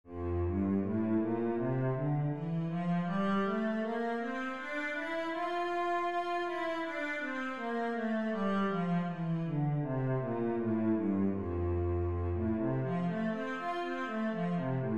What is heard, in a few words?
cello, f major